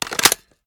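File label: reload empty fast mag in, shotgun; reloads; iw8; romeo870; gmod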